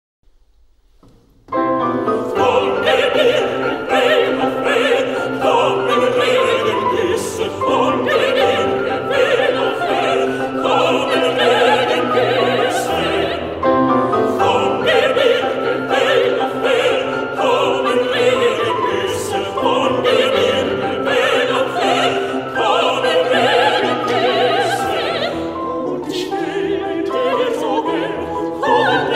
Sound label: Chorus; brahms; neueliebeslieder